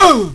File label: ouch; Dark; Clash